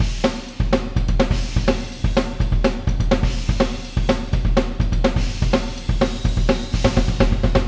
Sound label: Drum Bus Glue